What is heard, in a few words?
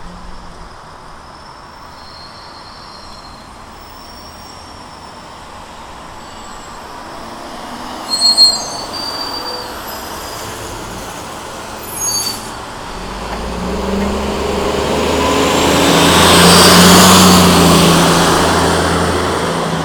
bus stop passing, street traffic; best service Blue Box